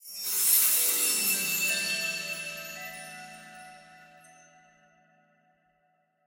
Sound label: trans To Main Game; wilderland